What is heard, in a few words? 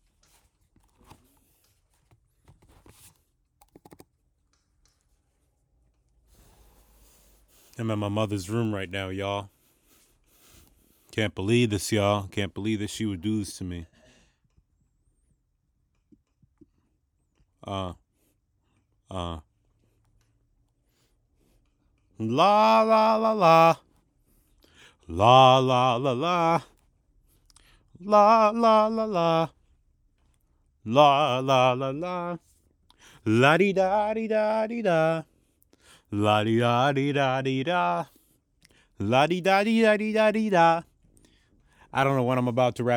epic freestyle (acapella)